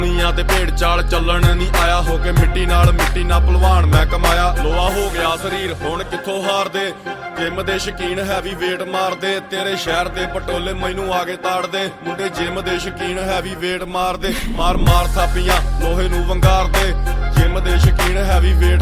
Punjabi Ringtone

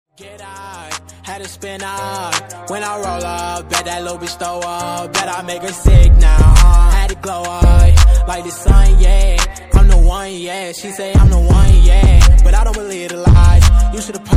English Ringtone

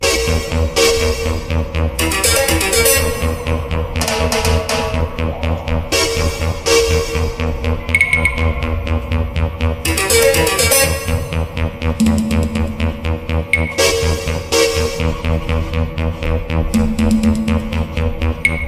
Action Movie Music Ringtone

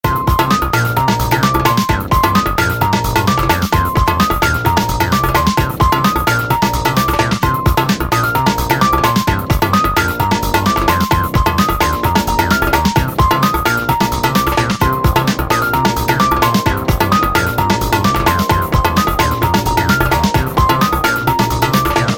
Chinese Music Ringtone

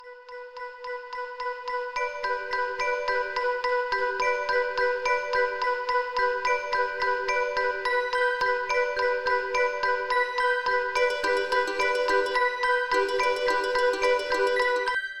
Motorola Departure Original Official Ringtone

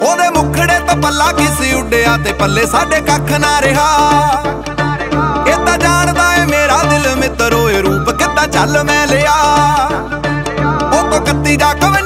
Punjabi Ringtone

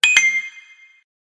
Coin Notification; Samsung S21

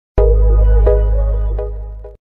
Love Notification Tone

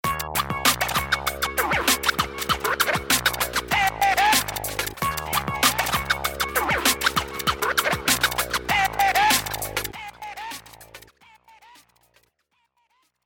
Oppo A33 Ringtone

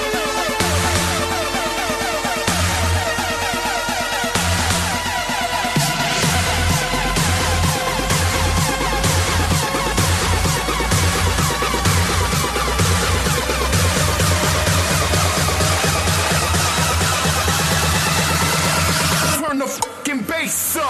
trance ringtone

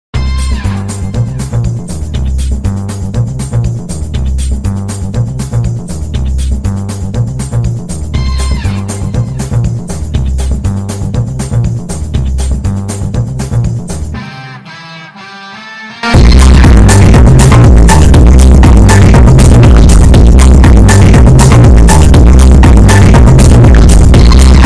Bass Boosted Ringtone; Subway Surfer

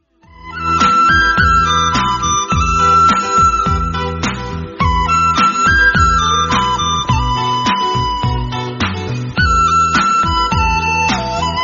Romantic Flute Heart Touching Ringtone

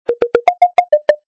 Honor Magic6 Lite SMS Ringtone

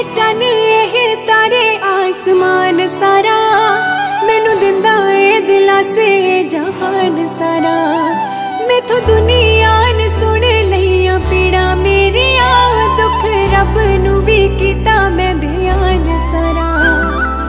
Punjabi Ringtone

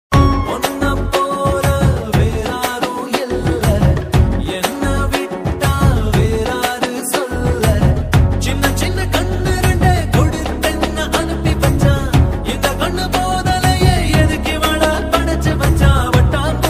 Tamil Ringtone

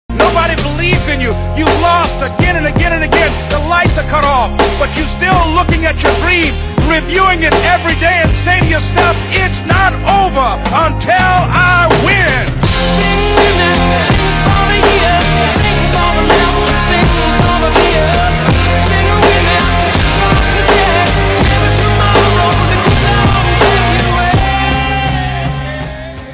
Anime ringtone

